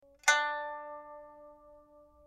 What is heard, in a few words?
pipa; chuYinKouXian